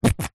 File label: foley sunscreen bottle squeeze empty nozzle; zapsplat